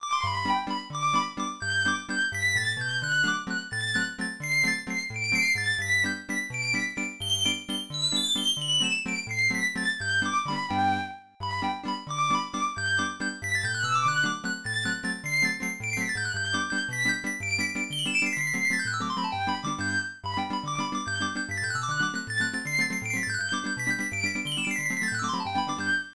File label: Fiddle Tune; King's Quest Quest for the Crown; Apple IIGS; Leprechauns Dancing to Fiddle Tune